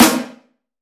focused steel snare drum sound a sharp key

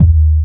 hq nice bass drum single hit d sharp key; kick drum

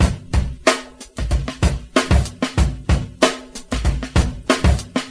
94 bpm drum beat d key

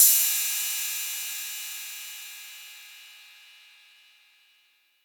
cymbal sound clip D key

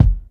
clear bass drum f key; kick drum samples

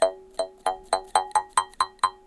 jaw harp recorded live

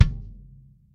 long room reverb kick drum single shot d key

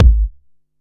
large room bass drum single shot g sharp key

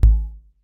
00s puffy kick single hit g sharp key; kick drum

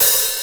studio open hat sound f sharp key

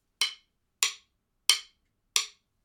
drum sticks - small room

